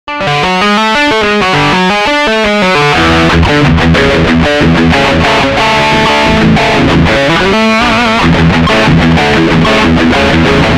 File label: RG Onboard Distortion